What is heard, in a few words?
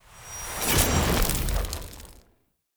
Fire Blade Cast; Weapons; Enhancements; Elemental